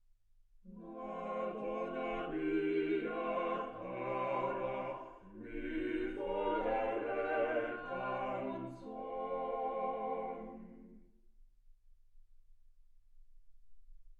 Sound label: quartet